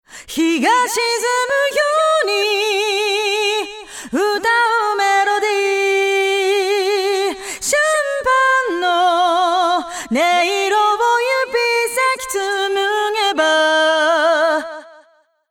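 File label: Chorus Flanger Delay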